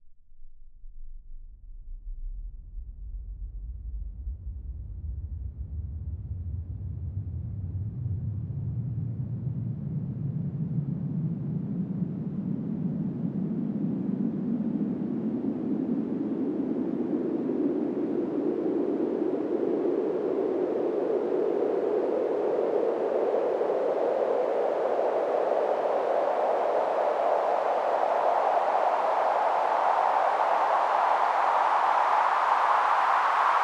Very Long Sweep, White Noise

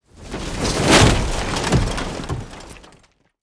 metal whoosh; Metal Debris

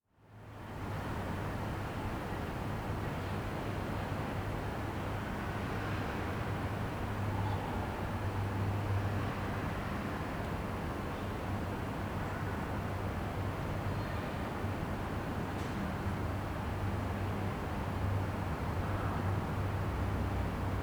Car Park Small Quiet; NoiseCreations; Aura

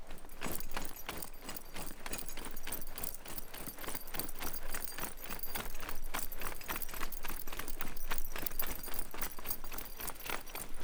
Gear - movements running; SoundMorph; INTERVENTION

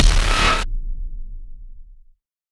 BRAMS, Cinematic Sound Effects; 99Sounds; Generdyn

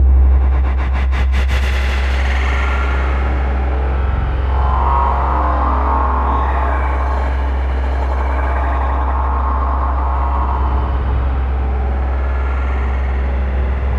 Abstract Texture, Scare Tactics; Glitchedtones

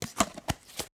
replace lid pringles tube

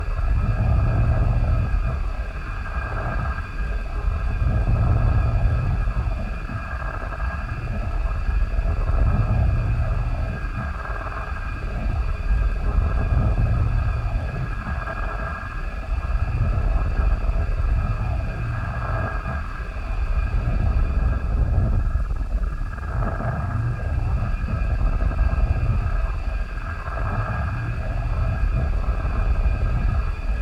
Industrial Drones; Sound Spark LLC; Industrial Drones and Ambiences

